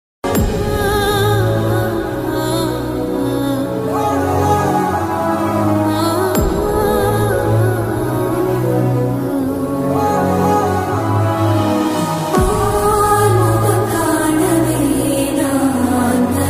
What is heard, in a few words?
song tamil; varisu